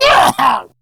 Pain, Battle Barks; en; Grineer Ghoul Sawman

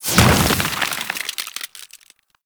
Ice Stomp; Warframe; Venus; Venus Hyena Astro; Abilities

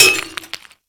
Glass Impact; Warframe

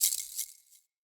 Banglenut Rattle Medium F

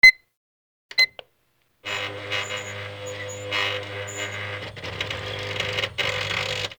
Office Fax Machine Misc; Warframe; Ambience; NineteenNinetyNine

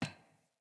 Grunt Boot Walk Metal, Footsteps, Boots; Enemy; Sinecure; Melee Grunt

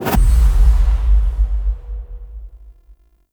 UI Defense Reward Open

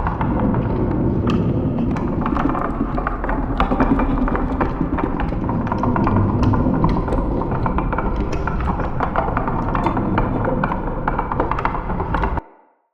Wind Mill Distant Loop

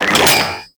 Sentient Sniper Reload; Warframe

